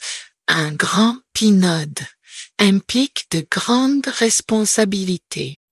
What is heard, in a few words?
jingle